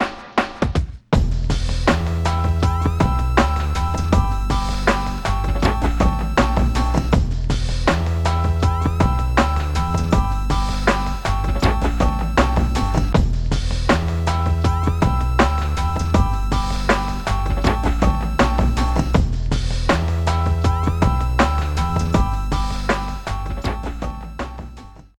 Rap Loop; The Jackbox Party Pack 5; Jackbox Games

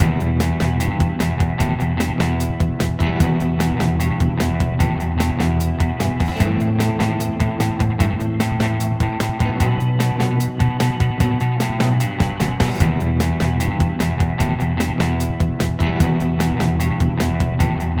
Post Question Loop; YDKJ; The Jackbox Party Pack 5; Jackbox Games

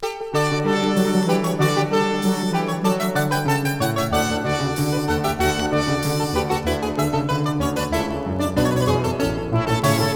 Marche in D Major; Suite From Anna Magdalena Notebook

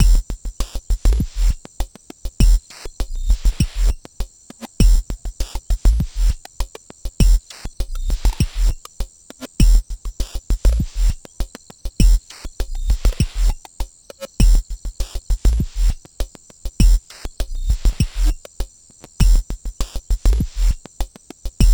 Background Loop; Jackbox Games; The Jackbox Party Pack 1; LIE SWATTER

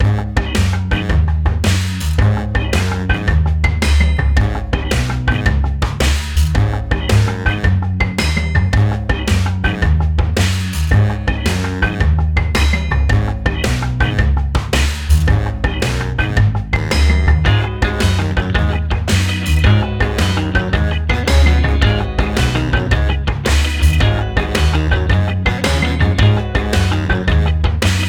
Round 2 Write Music; Quiplash 2; Jackbox Games; The Jackbox Party Pack 3

